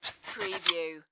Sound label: Billiards Cue Stick; sound effects bible